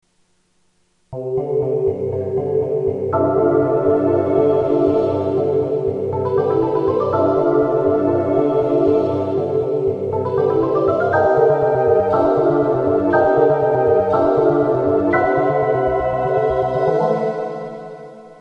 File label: opening echo